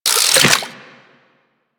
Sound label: Bubblegun Pistol Reload; Warframe; Weapons; Tenno